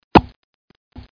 toggle; Game Head January 1996

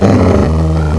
GRRR

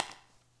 lino, ambient; Shadowman

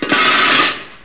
close tray; How Many Bugs in a Box

